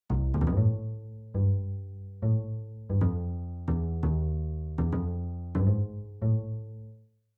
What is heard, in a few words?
Bass Loop